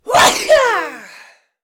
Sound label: Sneeze